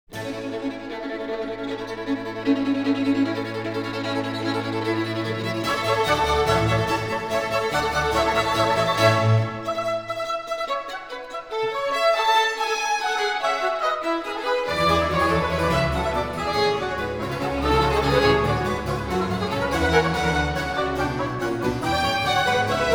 sinfonia for 2 oboes, strings & continuo in B flat major; Telemann; Tafelmusik; Conclusion; Tafelmusik III／6